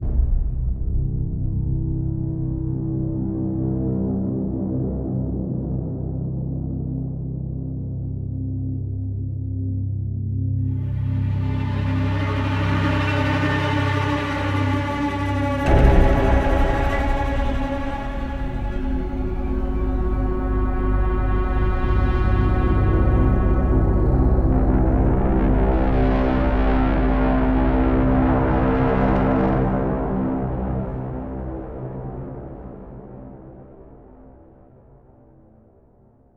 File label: Suspense Oneshot